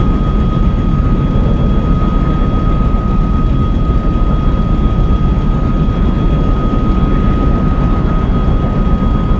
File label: engine cockpit; War Thunder; engines